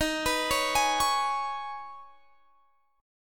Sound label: Db 7th Suspended 2nd